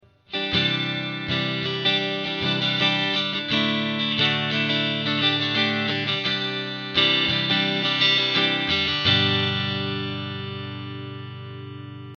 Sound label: Neck Strumming